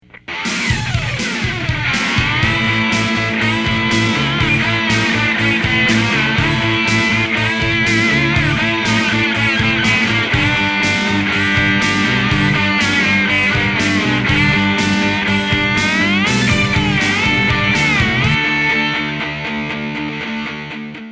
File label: Metal